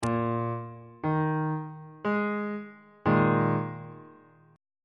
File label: g sharp suspended 2nd 1st inversion on bass clef